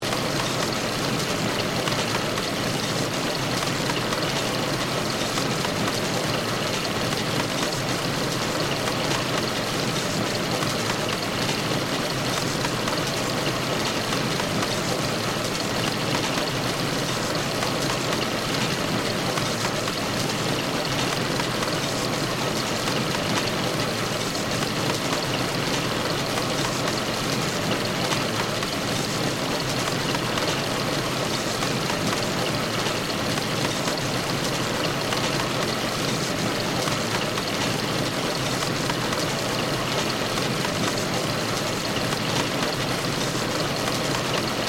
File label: Steampunk Engine, Scifi, Steampunk, Engine; Science, Fiction; BlastWave